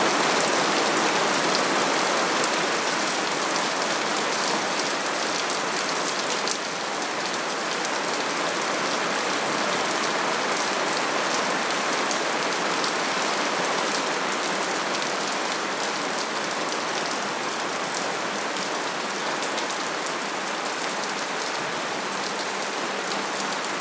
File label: thirty seconds of rain